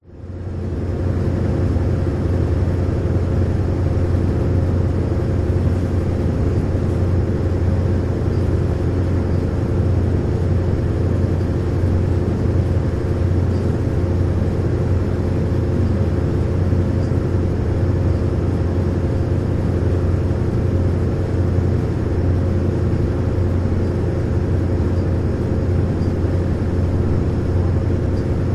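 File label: vehicles boat ship ferry exterior top deck ambience engines slight waves sound; zapsplat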